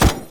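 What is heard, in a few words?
attack helicopter machine gun shot; hl2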